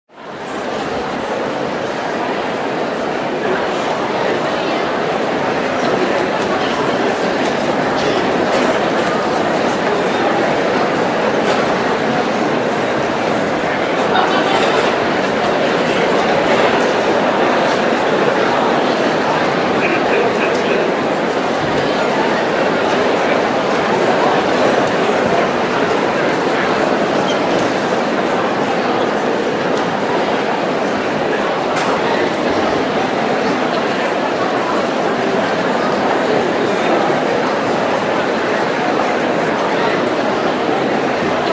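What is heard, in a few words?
sounds of cinema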